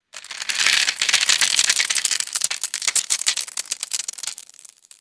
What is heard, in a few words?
pouring small plastic debris pieces